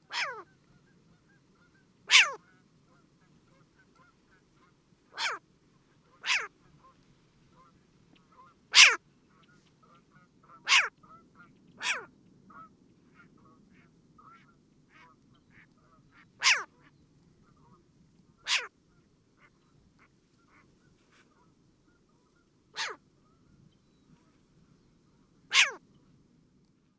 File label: short eared owl bark